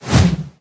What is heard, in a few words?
swing whoosh